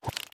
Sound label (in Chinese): 浮漂收回声